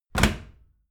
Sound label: washing machine tumble drier door close